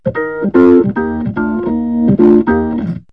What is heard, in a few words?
077 mello rock organ; KEYS ORGAN PIANO LOOPS